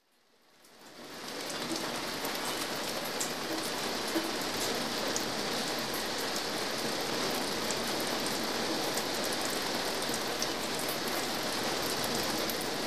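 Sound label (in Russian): сильный ливень льет по стеклу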